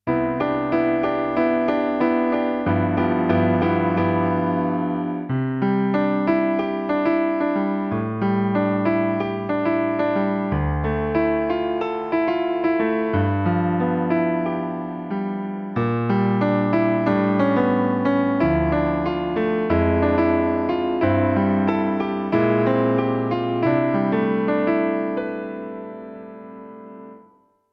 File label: karaoke